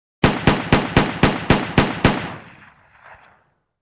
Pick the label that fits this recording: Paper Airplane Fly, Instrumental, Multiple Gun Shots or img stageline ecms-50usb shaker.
Multiple Gun Shots